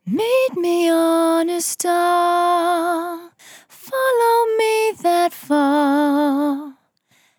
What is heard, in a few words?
On A Star 65bpm F, Vocal; Zero-G